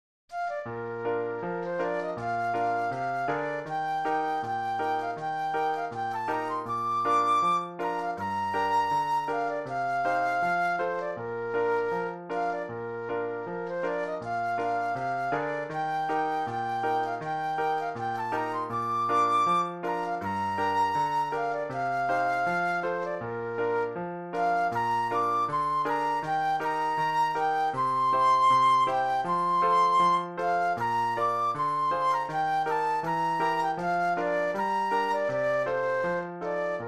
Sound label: Dixie Flute Solo; patriotic; patriotic solos